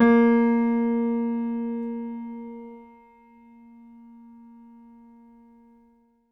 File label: MIX L, Acoustic Piano, PIANO STEREO; InVision Interactive; Miroslav Vitous